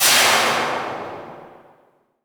Impacts

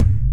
kick, DnB Breakz Kit; Breaks N Beats Sound Kit

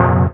Synth Shots; Vengeance Samples

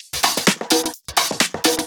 Houseloops 128BPM; Vengeance Samples

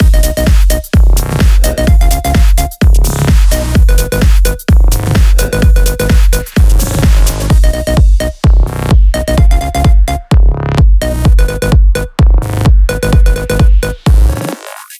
128BPM Mix; Vengeance Samples; Suisse Kit

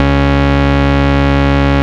Ultimate Bass Root C#; Vengeance Samples

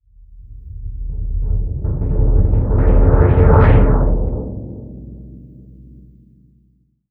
VFX2 Sub

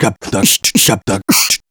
Beatbox Loops, Human Beatbox

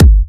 Kicks; Vengeance Samples

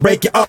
Vocal Phrases; Vengeance Samples; Vocals

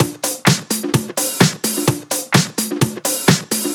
Housebeats, 128BPM; Vengeance Samples; VEH2 Loops

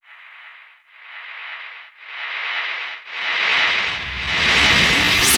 FX Uplifter

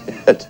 LoFi Vocals Oneshots; Vengeance Samples

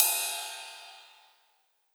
Ride, Cymbals

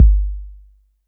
Bass Drop, 808; Street Anthems Sound Kit

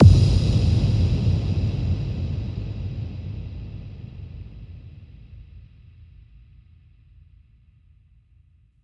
Intro 130BPM FX

